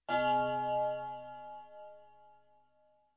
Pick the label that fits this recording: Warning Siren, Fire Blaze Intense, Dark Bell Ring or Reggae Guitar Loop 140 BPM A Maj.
Dark Bell Ring